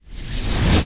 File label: Vortex Closed